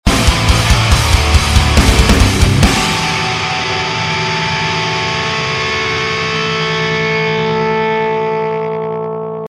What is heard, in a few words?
outtro music loss; the myst scout